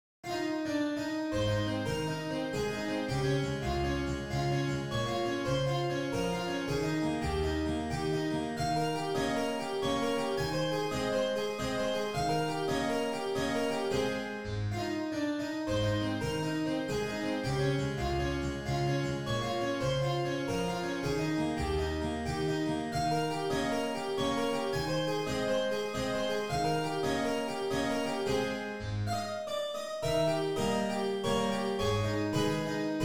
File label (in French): valse; beethoven ludwig van